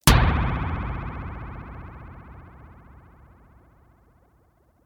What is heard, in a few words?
sci-fi metal hit wobble long; whooshes rises; hits impacts